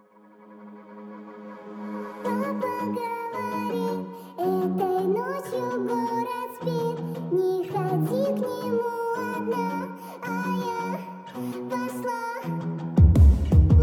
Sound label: slowed reverb